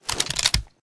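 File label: shotgun raise; reloads; iw8; romeo870; gmod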